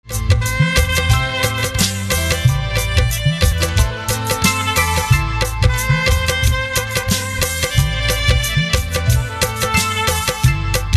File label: Saxophone Instrumental Ringtone; desh bhakti instrumental ringtone